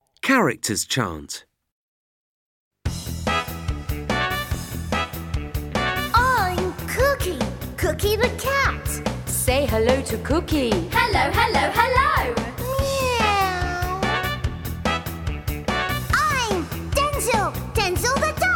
characters song

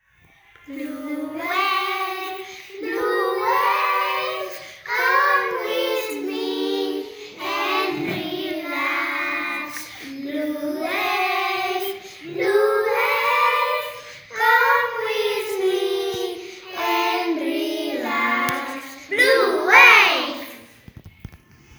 Blue waves; racons competencials ci i 3r english

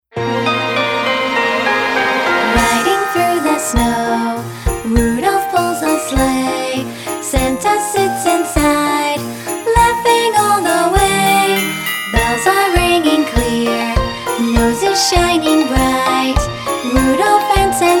Jingle bells

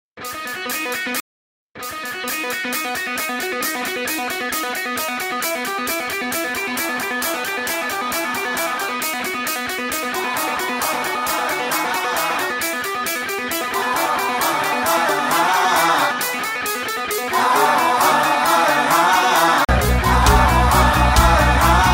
Karaoke Version; Zoom Karaoke

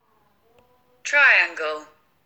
Triangle

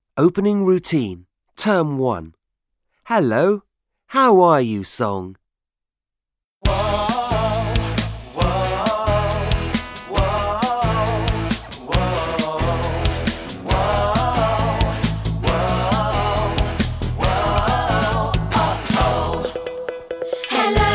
Hello song

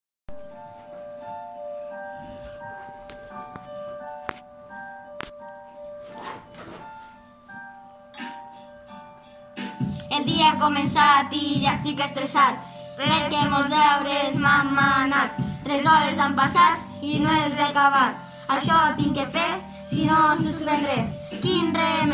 grup rap

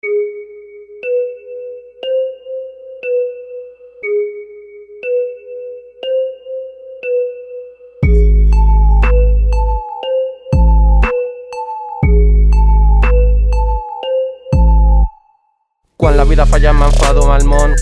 120 BPM

